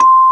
MUSIC BOX C3; AKAI S6000 CD-ROM - Volume 1

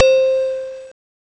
glockenspiel, Amiga Soundtracker Sample; Amiga Soundtracker Sample Packs

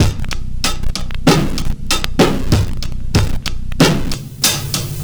R&B Drums

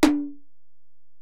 Tom, Alesis DM-PRO, Drum Machines, Toms

